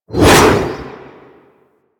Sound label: Arch Wing Sword Whoosh; Warframe; Weapons